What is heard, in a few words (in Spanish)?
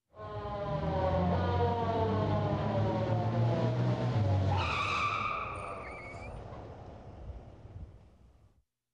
frenazo